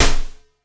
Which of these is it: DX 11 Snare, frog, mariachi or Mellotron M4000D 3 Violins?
DX 11 Snare